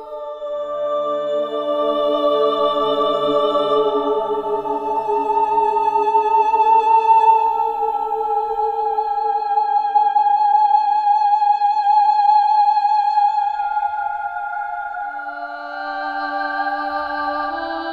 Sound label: Syrens Call Loop